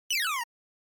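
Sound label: Game Boy General Pitch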